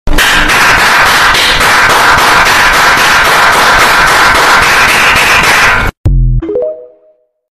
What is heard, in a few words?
clapping